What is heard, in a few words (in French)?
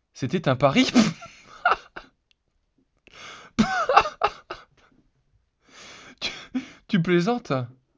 rire naturel